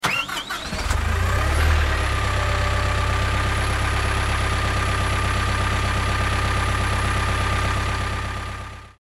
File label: Ringtone Engine